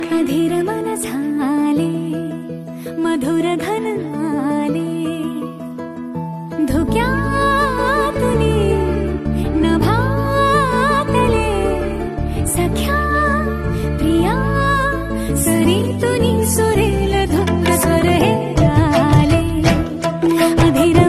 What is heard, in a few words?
Marathi Romantic Female Love Song Ringtone; ringtones